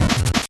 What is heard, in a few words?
8bit se